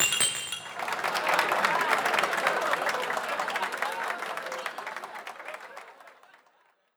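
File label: party toast; PARTY KANPAI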